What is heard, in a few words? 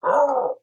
Leg Eater Alert; gamerip